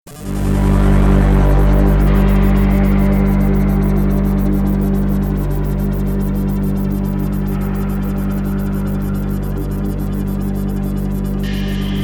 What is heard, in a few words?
Electro Gypsy Dnb Remix